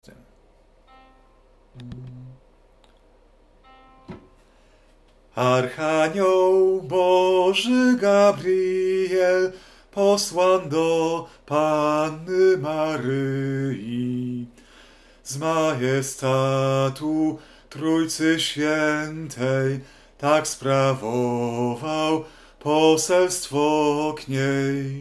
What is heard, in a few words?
Sopran; Archaniol Bozy Gabriel; Adwent